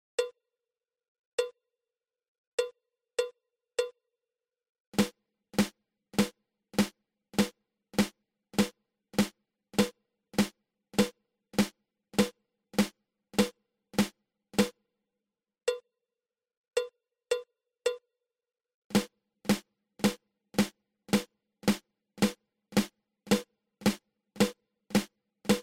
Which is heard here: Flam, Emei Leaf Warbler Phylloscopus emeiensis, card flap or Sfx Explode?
Flam